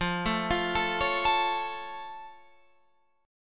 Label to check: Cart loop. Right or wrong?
Wrong